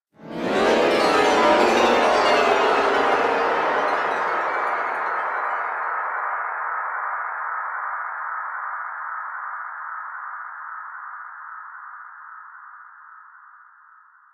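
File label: Grand Piano Cacophony; keyboards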